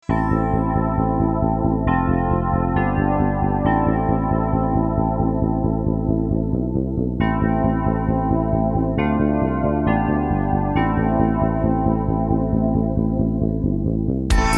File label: House of bells; Pop & Lounge Music